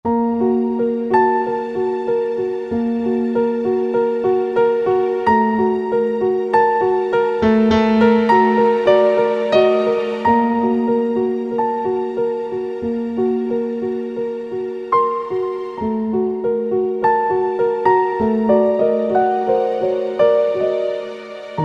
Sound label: Piano Strings; Roland JV-2080